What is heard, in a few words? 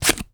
Cutting Cardboard with Scissors; 1001 Sound Effects